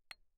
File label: xylophone hard rubber mf C8; Percussion